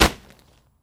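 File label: Heavy Bag Hit, boxing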